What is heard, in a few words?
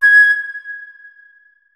flute notes; Hideout Furniture